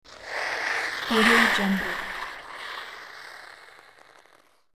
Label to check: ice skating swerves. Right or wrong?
Right